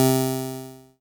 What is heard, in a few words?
ELKA, String Machines; ELKA RHAP